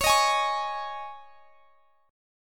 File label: C sharp Suspended 2nd, C sharp; C#sus2; strummed; x,x,23,20,21,21